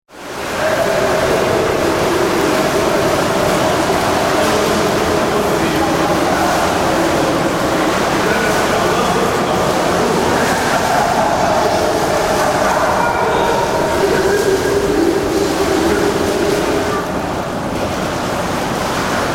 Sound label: Indoor Pool, Sound of people enjoying an indoor swimming pool.; Backgrounds